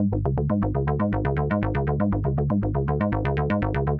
SH101 Arp (120BPM), retro house

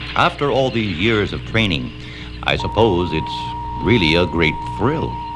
VOX GENERAL; Megabass Remix! 2

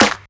Clap; Equipped Music; Loungin House

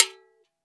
AFRO AGO, AFRIC AGO GO; AKAI S-Series CD-ROM Sound Library VOL-5

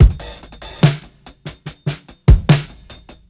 FAT 145 32ND BOUNCE, DRUMS LOOPS; BASEMENT DRUMS VOL 2

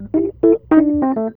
E.PIANO, KEYS

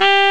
saxophone; Amiga Soundtracker Sample Packs

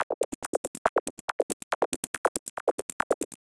perc 140 flitter, Percussive Loops 140 bpm

